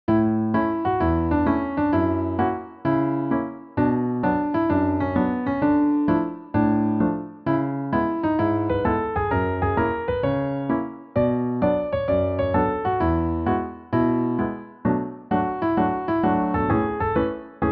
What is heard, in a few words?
Newage